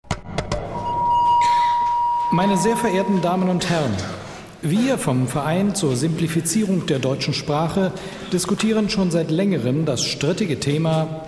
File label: jingle; strittiges thema